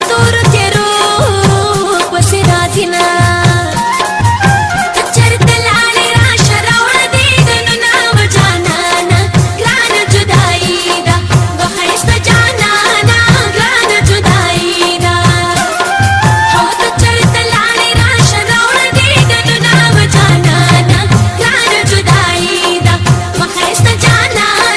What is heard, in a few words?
pashto ringtone